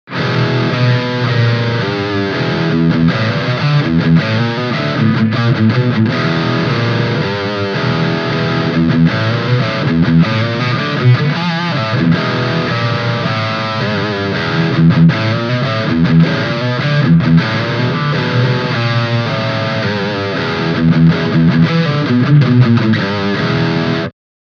Riff volle Mitten

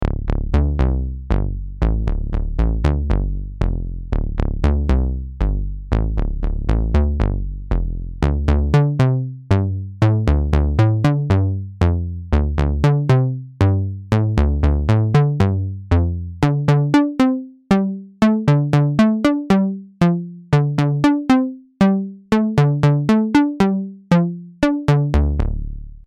Raging Bull mit Filter

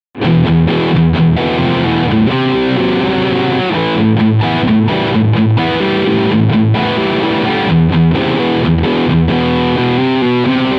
Harley Benton SC 450Plus Overdrive Steg Pickup

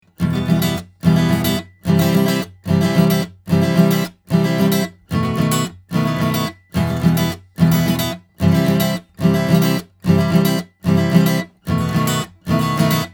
Akustik Gitarre Astin Origin 200Hz 6dB Air

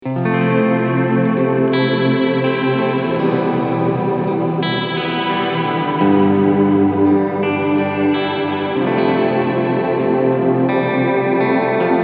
Hall Reverb Cleansound Verb 12 Dampen 12 Decay 3 Uhr Modulation; JHS Pedals 3 Series

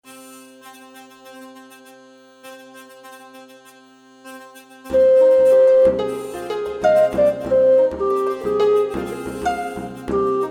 Drehleier Harfe Trommel Gemshorn

